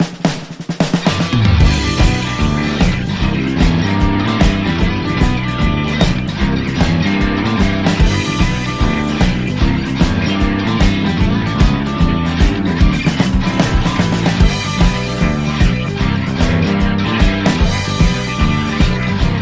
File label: Mid West Jam, No Harmonica